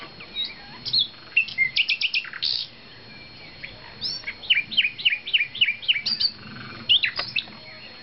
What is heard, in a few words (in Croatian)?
slavuj danju; Vugrovec